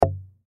Electronic Drum, Electronic Drum Simmons Cymbal Pad Single Hit; Drum Kits; Musical; Blastwave